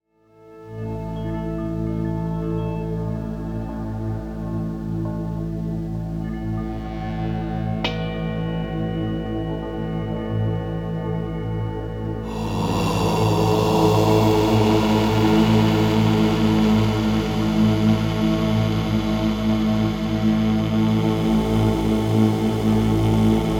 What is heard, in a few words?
Mystical Bells